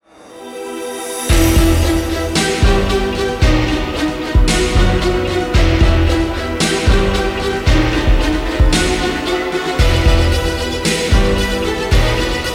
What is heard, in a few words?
Cinematic